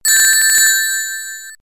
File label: ring loss; sonic the hedgehog